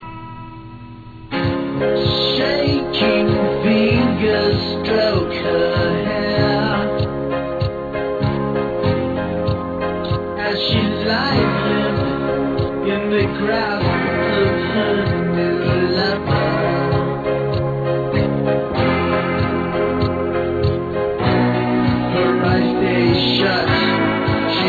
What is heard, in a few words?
ballad